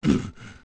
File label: Hurt; OOT; Iron Knuckle; Boss